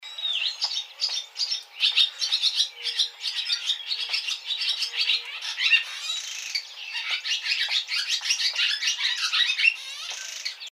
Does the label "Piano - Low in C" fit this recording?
No